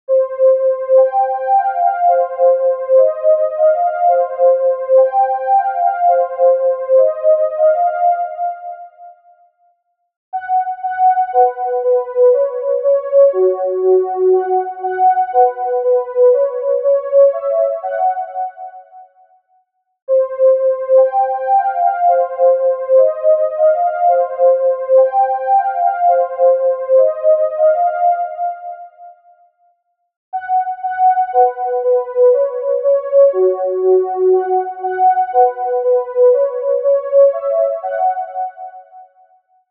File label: Matrix Pad MW Energy Stab 120bpm C